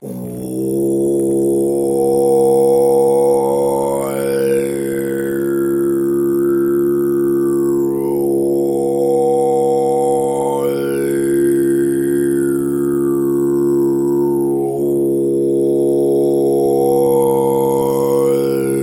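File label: Buddhist sounds singing single long meditation ringing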